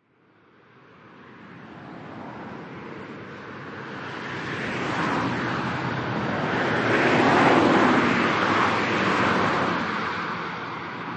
Heavy Motorway Traffic, Cars, trucks moving on high speed freeway; Cars, trucks moving on high speed freeway, highway or motorway.; Traffic And Car